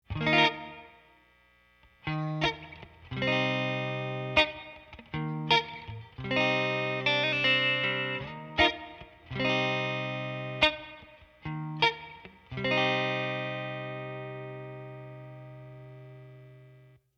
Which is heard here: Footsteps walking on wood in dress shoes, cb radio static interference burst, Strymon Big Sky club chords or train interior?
Strymon Big Sky club chords